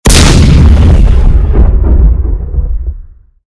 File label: gun